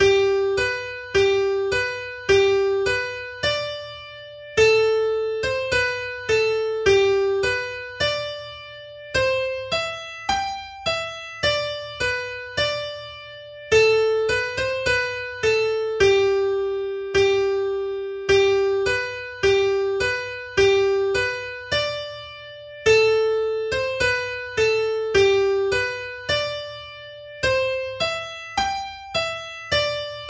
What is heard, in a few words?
slow; Winster Gallop